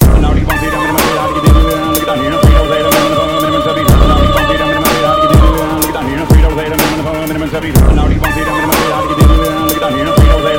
AMEN BASS; AUCTION

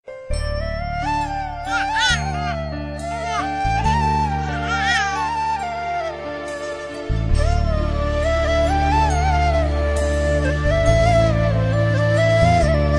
Ringtone

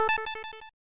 1up Balloon; DKC2

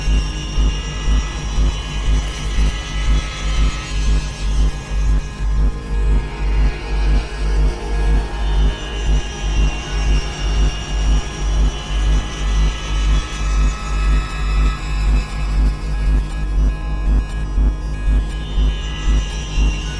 Hacking Ambient

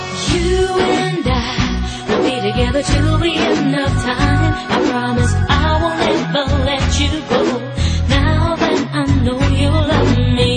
Love Ringtone

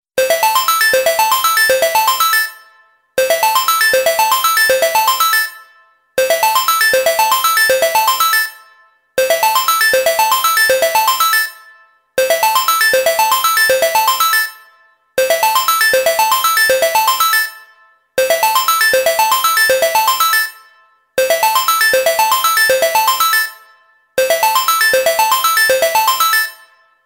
Arpeggio ring

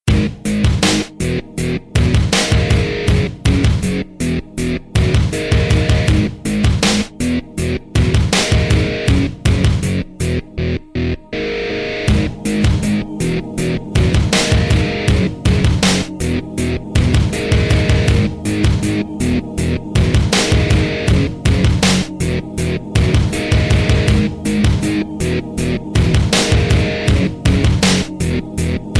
Hard Bass Guitar

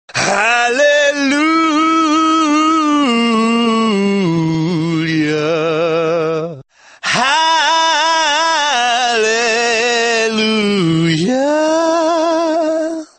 Hallelujah Sms Tone

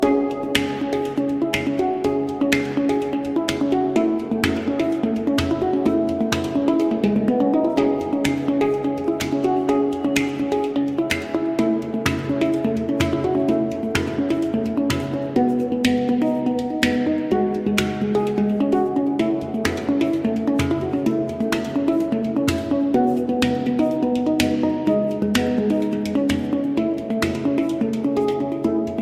HANDPAN; New York

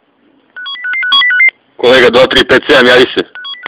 Police

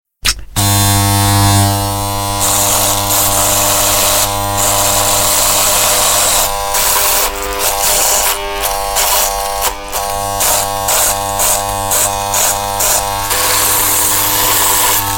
Electric Shaver

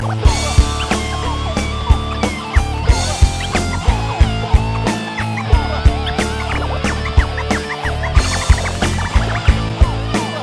scratch

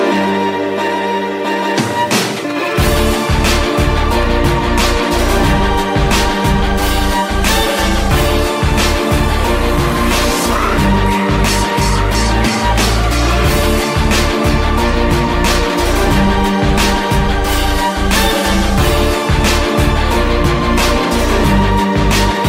Beats

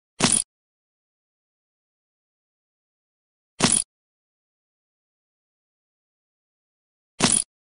Cowboy Boot Spurs

